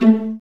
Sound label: VA PIZZ A2, Orchestra; Best Service; Peter Siedlaczek's Advanced Orchestra